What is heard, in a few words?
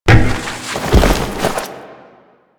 APC Dismount Foley; Enemies; Lasrian; Warframe